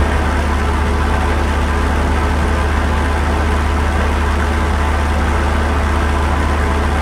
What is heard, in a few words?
bomber cockpit, engines; War Thunder